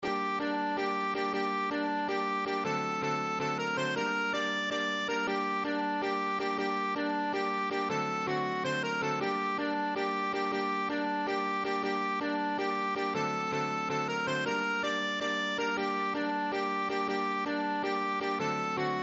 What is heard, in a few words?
Ruchenitsa, balkan